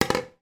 toy plastic toy watering can put down in sink

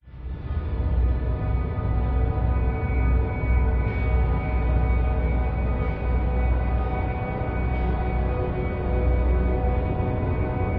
drone atmos dark subtle evil tense; horror; zapsplat

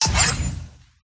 Objects Items Interactions Menus; PMSFX

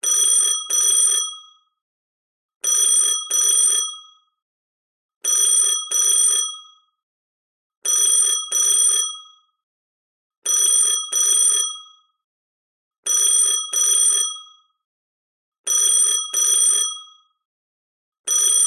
household telephone old bell type ring uk

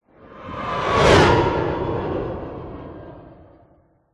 science fiction space vehicle whoosh past

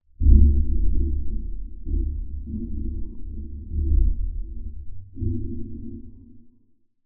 manipulate underwater or space distant; lukas tvrdon

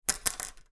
hair clip small metal drop surface

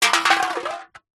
soda can empty drop concrete ground

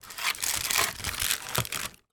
box chocolates plastic sheet remove; foley

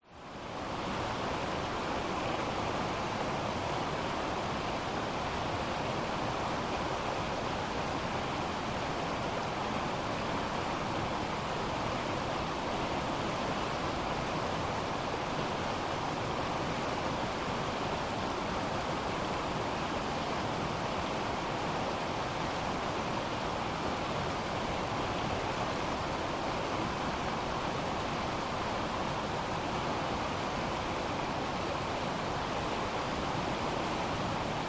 nature river fast distance bear creek colorado

